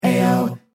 Shout Out; Aio Wireless

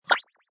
effect unlocker; Lenovo; K900; ui

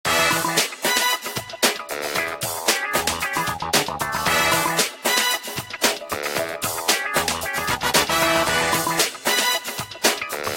Funky Machine, ringtone, club dance; Samsung SCH-W420

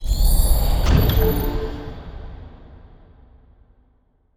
Power Off, phonetones; 5GX Quantum; SK Telecom

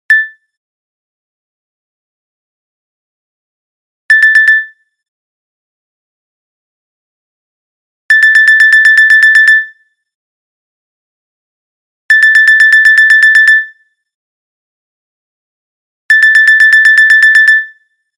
Alarm Clock; Nokia; 8110 4G

